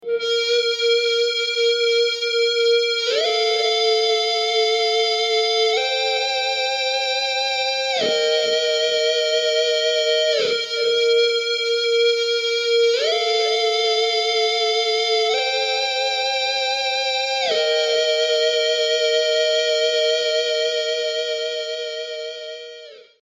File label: custom shift